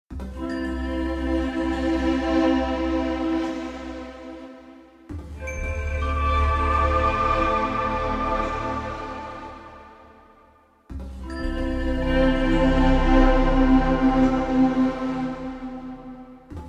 Dripping Bells

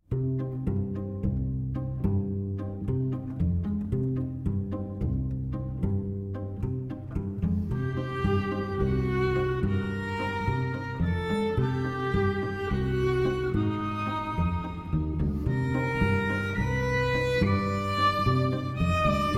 String Quartet; No. 1 Atomizations IV. Universe Expansion

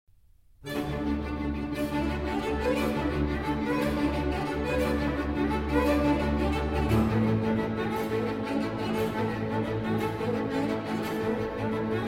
Concerto in E-Flat Major for Violin and Strings

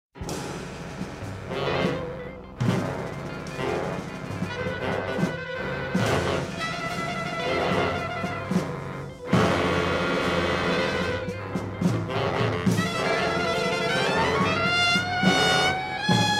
Drums

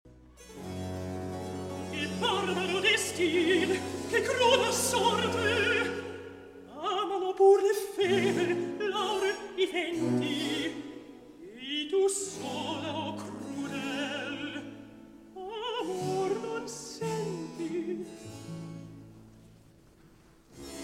Recitativo; Che Barbaro Destin; Polifemo

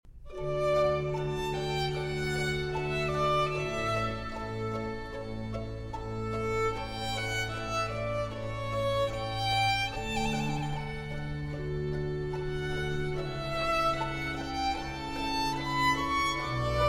Concerto For Violin and Strings in F minor; Op. 8, No. 4, R. 297 L'inverno

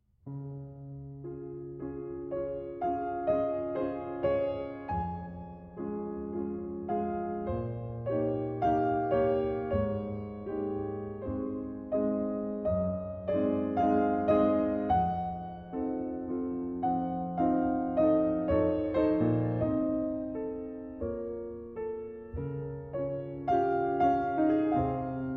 Lieder For Piano No. 3, Larghetto; Op. 8; H-U 461

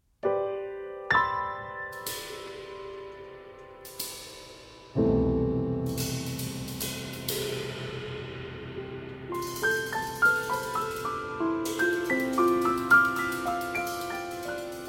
for Piano & Drumset

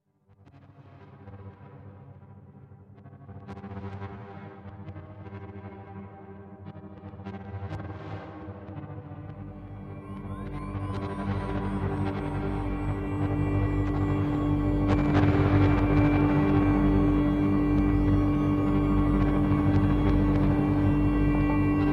Instrumental

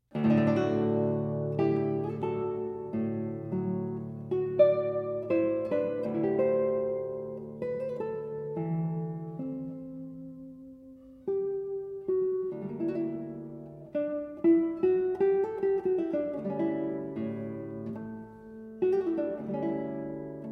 Guitar Sonatina, Andante

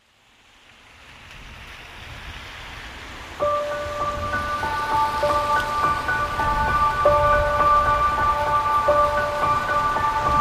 Piano Fantasia

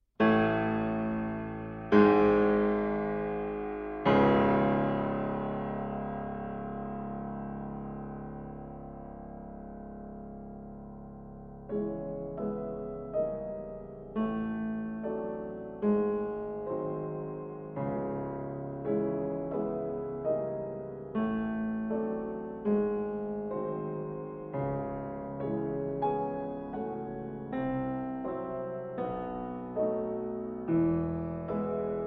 Prelude In C-Sharp Minor

